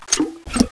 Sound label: Draw; zombie amxx ru